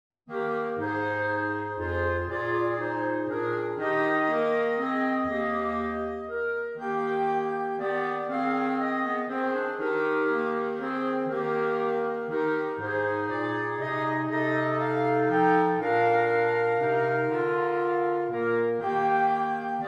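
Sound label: It Came Upon a Midnight Clear clarinet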